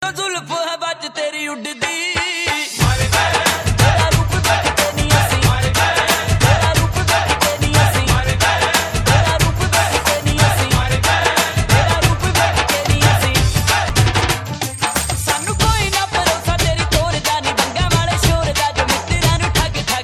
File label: remix punjabi ringtone